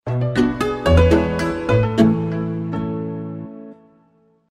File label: tambal; nokia tune